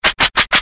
Defective Turret dryfire; sp sabotage factory

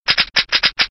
Defective Turret defect dryfire, ru; sp sabotage factory

